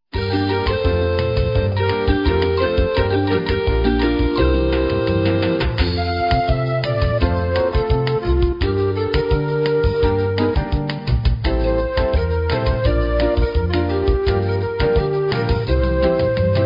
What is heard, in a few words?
Bossa Nova